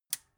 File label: metal button push power on